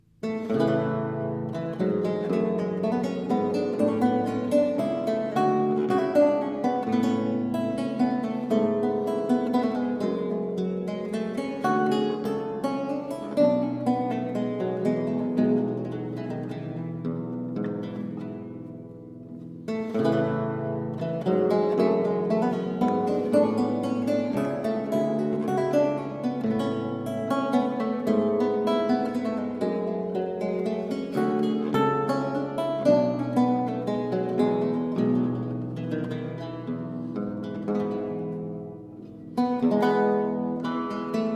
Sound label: Courante, Lute